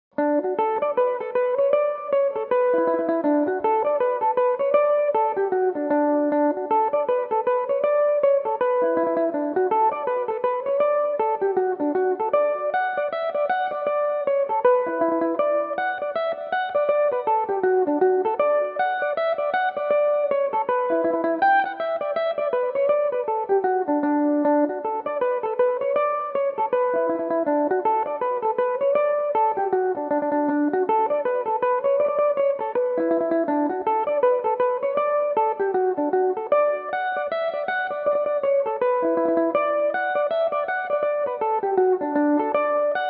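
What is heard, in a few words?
D major belmuse, irish mandolin; con mullanes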